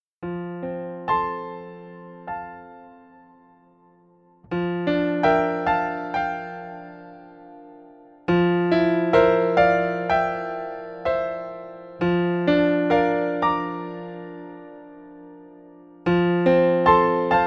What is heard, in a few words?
ac piano dry